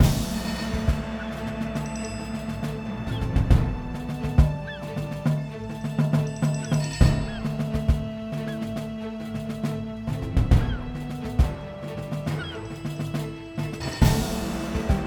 Background Loop; genesis; jasons Quest

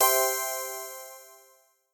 win popup; Skillzgaming; fruit Blast 92 Desktop

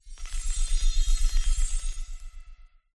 wild highlight; Recode; rampage riches Desktop

